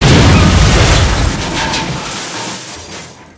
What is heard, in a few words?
metal large debris; Source Engine; video game; physics